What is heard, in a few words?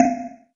tick; Drunken Robot Pornography; Inago Rage